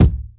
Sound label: clean bass drum c key